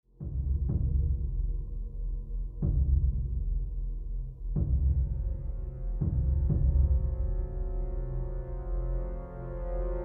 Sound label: String